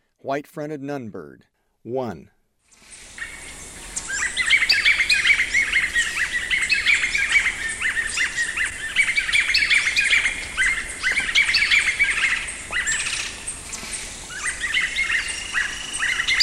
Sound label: White-fronted Nunbird; S. America; Eastern Lowlands